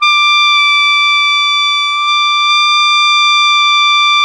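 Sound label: ALTO pianissimo D#5, Sax; Giga Samples Collection; ALTO SAX PP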